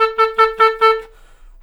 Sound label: Clarinet